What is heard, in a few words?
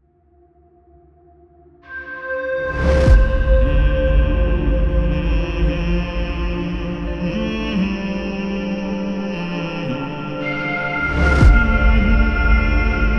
Ambient Sci-Fi Vocal Trailer